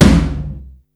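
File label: tom ambient low, Yamaha Motif ES-Series; 300 Drum Machines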